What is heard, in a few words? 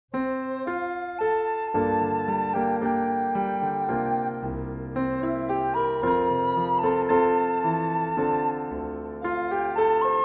piano